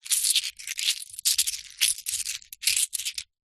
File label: coins movement hand; foley